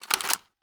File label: mp5 mag out, weapons